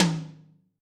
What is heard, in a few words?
RoomP H, Tom, Yamaha DTXpress-II